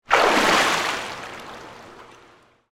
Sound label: Pool Indoor Surface, Water, Splash; Pools; BlastWave